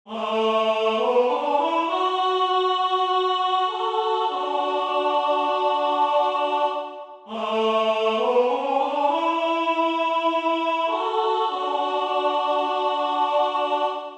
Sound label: 2 Part Arrangement Midi Recording